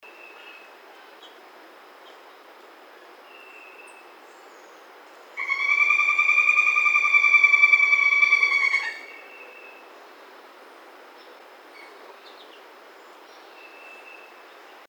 Northern Flicker

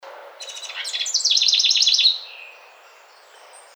House Wren

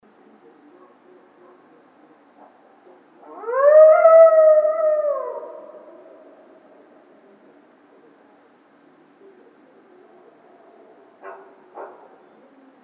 Coyote Triple Howl

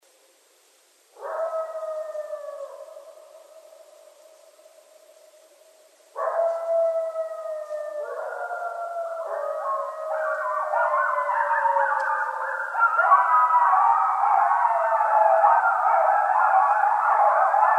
Coyotes; Coyotes (Canis latrans)

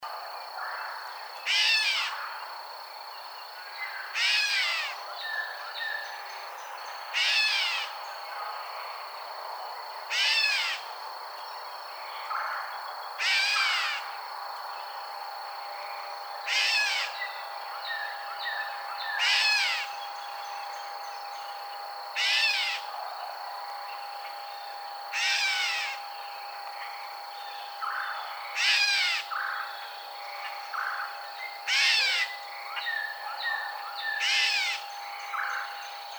Gray Catbird

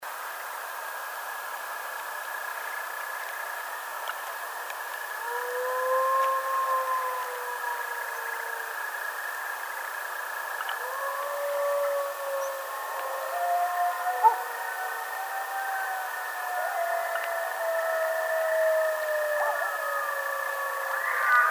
howl, Coyote; Canis latrans; Coyotes (Canis latrans)

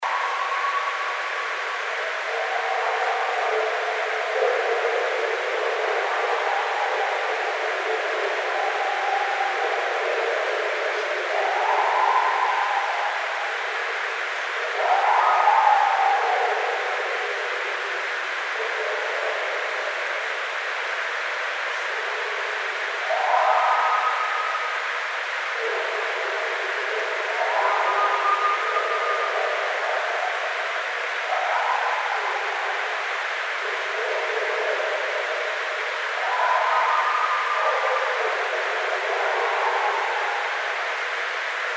Scream; bigfoot

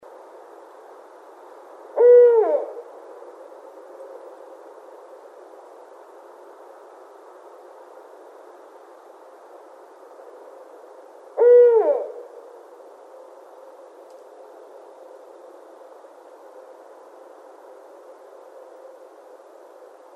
Barred Owl

